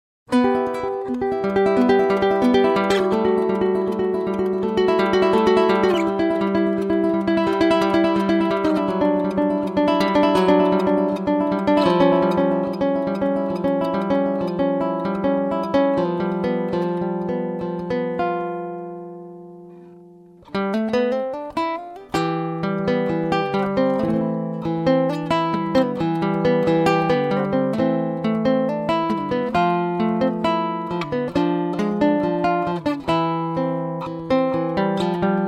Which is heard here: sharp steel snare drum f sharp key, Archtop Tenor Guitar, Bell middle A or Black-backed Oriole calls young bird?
Archtop Tenor Guitar